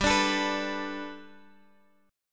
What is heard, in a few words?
G Major Add 9th G down Guitar Open G